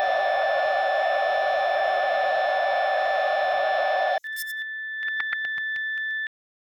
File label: communication, sound-effects